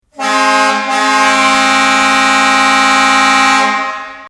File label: Marine Duplex, horn sounds